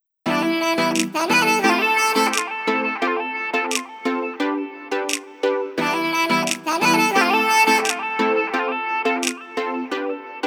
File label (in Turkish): arabesk oryantel; by burak sound bank; arabesk oryantel vol 2